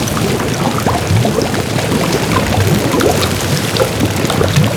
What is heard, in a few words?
Lava Tube; Warframe; Corpus Gas City; Ambience